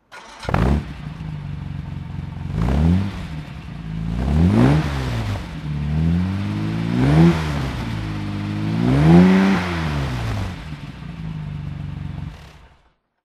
aston martin db2-4 mk3 throttle

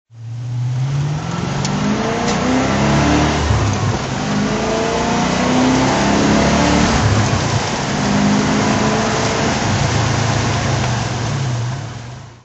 mg rv8 drive

